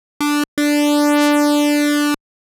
horn

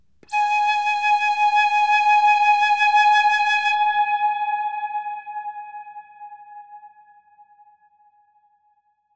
processed flute

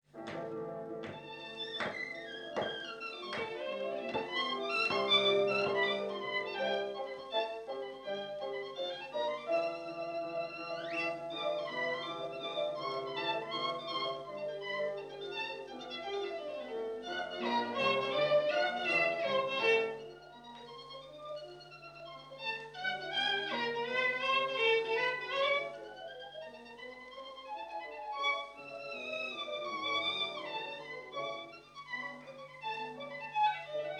Tarantella, violin recordings; Casella; Cillario

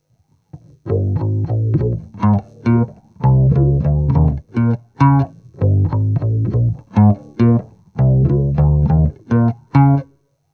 bass clean autowah

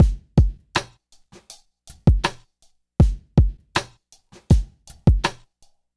drumloop

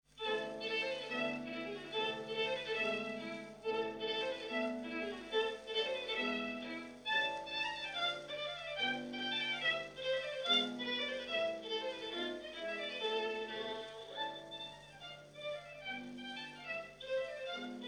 Paganini Caprice N.24; Cillario

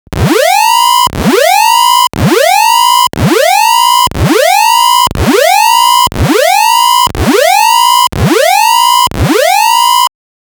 Alarm sound

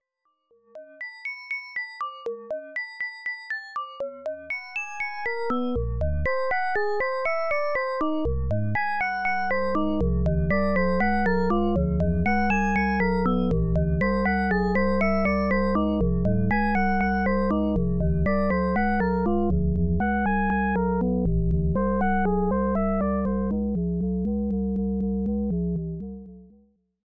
Web Traffic Sonification (Fast); Data Sonification; DECO1013:Assignment 2:Data Sonification

